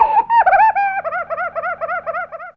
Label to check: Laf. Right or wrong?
Right